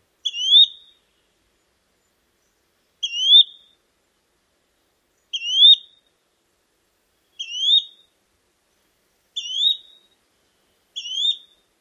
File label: turree call